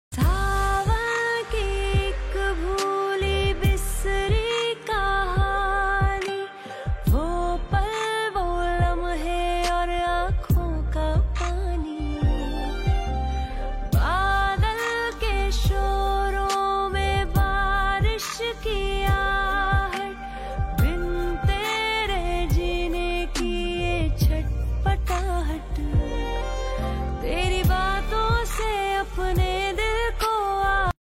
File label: Female Sad Version Ringtone